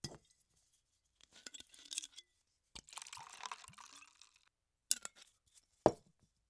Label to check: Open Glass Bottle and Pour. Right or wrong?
Right